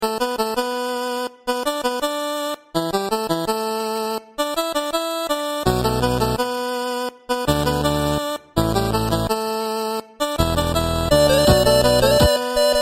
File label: 8 bit remix